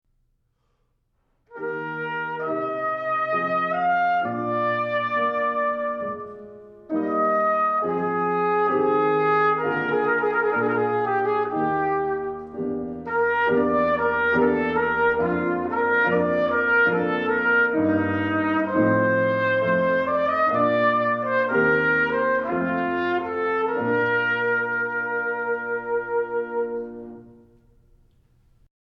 Trumpet Piano

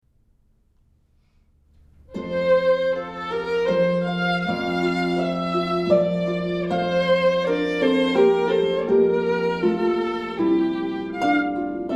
Harp Violin

